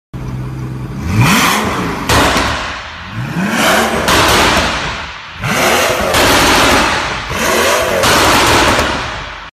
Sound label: pagani huayra; اگزوز